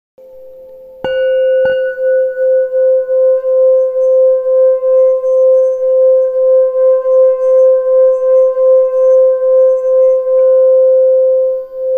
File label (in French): bol lingam, Bol tibétain traditionnel martelé en 7 métaux; Fabriqué au Népal; Note : Si; Chakra couronne